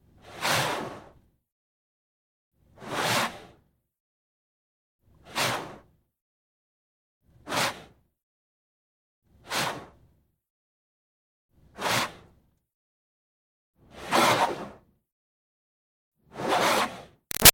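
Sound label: Water Spray Interior Car By Soft Fast; Car Wash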